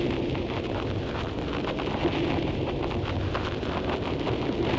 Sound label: Cracklature, sparse soundscape